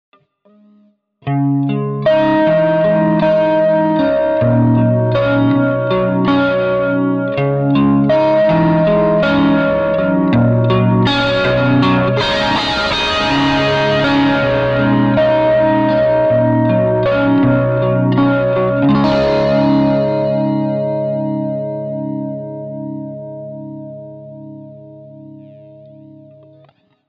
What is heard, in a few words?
AC30 mod crunch